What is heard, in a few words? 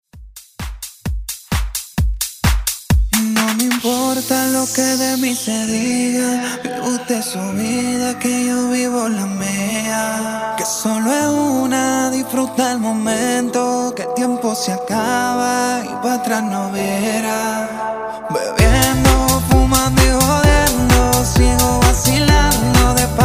Latin House Redrum